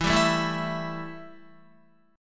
E Minor Double Flat 5th E, Guitar; strummed; JGuitar

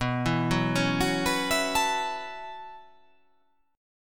B 9th Suspended 4th B down

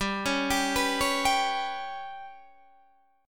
G Major Flat 5th Guitar DADGAD, chord

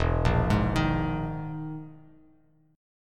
E Major 7th Flat 5th down Bass Standard

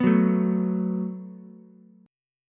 Gb Minor Double Flat 5th, Ukulele; strummed; 4,6,5,x

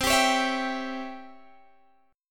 C sharp Minor Major 7th Flat 5th C, guitar chord

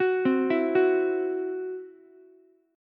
C-Major Flat 5th, Ukulele; arpeggiated

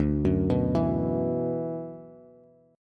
D 6th Add 9th, Bass; 10,9,9,9; arpeggiated; JGuitar

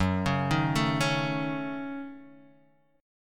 Gb Minor 6th, Guitar Standard; arpeggiated; JGuitar

